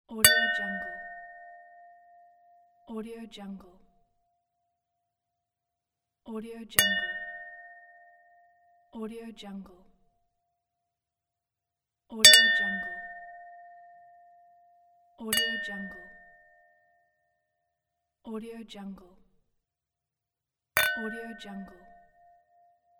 wine glass clink

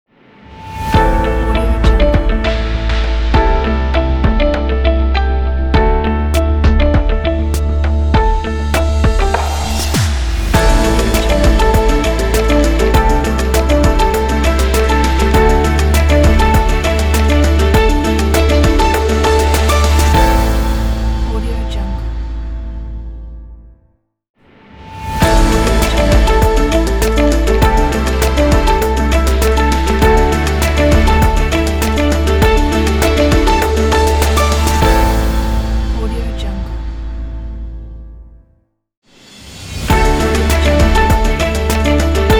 business technology logo

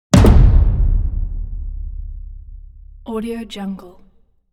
cinematic hit drum hit loose medium

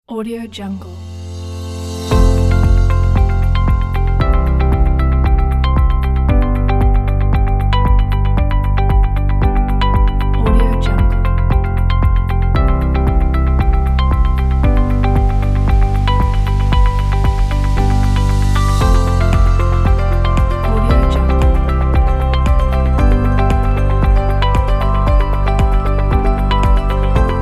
inspired corporate

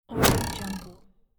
cupids arrow; audioheart

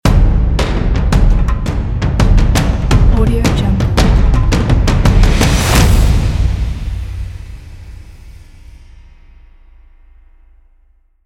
the epic battle drums logo

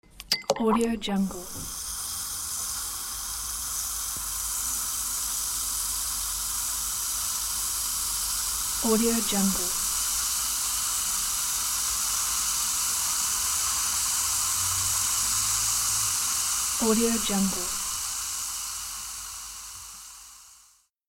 dissolve pill in water

